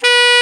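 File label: TENOR HARD D#75, TENOR SAX; Northstar - New Gold VOL-1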